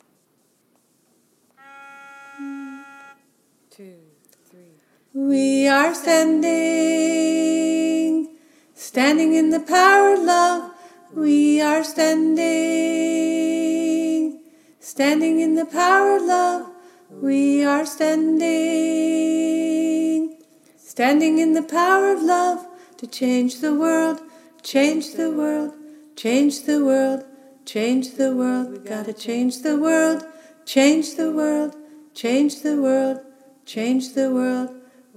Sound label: soprano, choir; The Freedom Choir